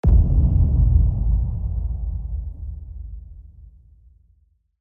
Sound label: bass boom